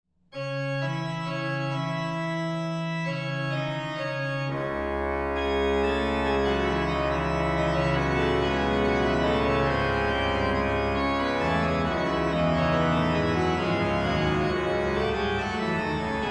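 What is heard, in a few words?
toccata, organist; resurrexi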